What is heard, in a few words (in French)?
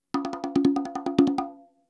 frise, congas